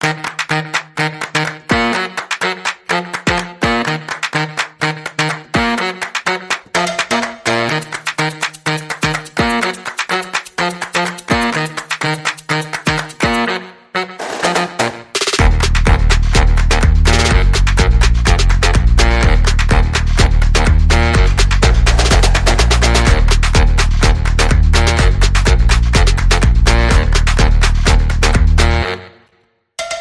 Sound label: Light Percussion